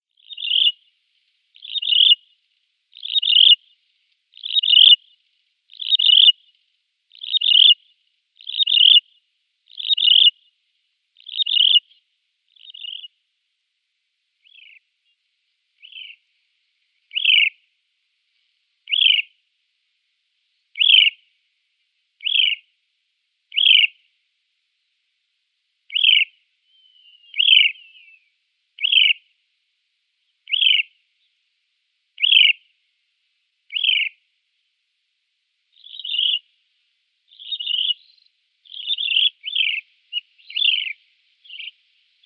Desert Lark flight song NW Africa

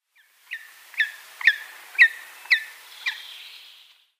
Grey-headed Woodpecker calls

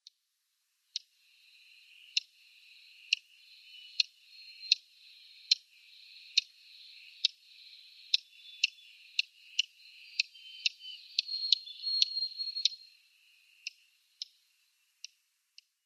Dark-eyed Junco calls

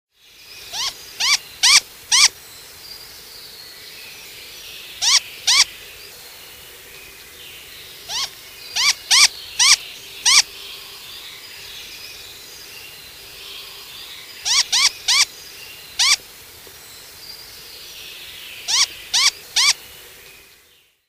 Great Grey Owl downy Young

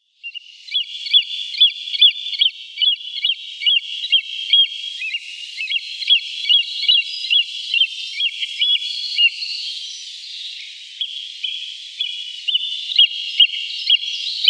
Great Crested Grebe downy Young

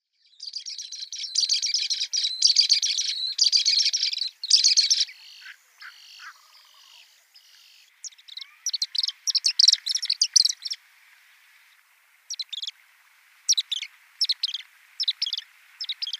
Pied Kingfisher

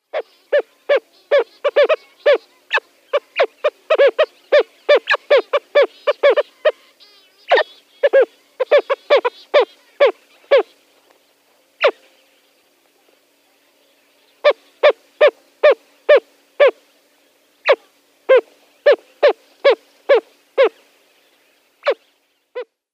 White-faced Storm-Petrel

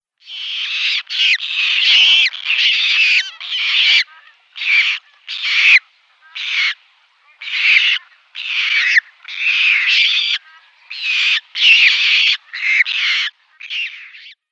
Squacco Heron nestlings

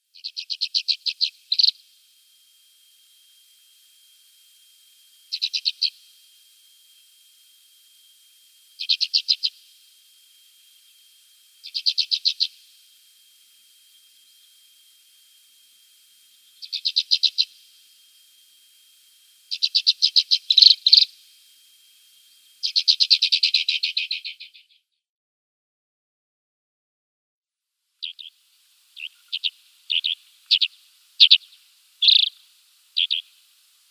Alpine Accentor calls

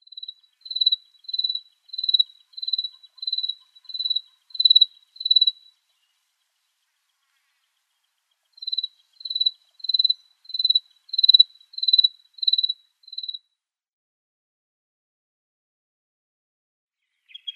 Cricket Longtail song

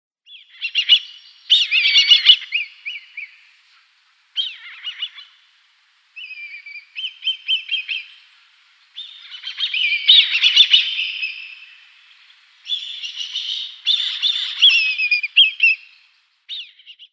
Black Kite nestling

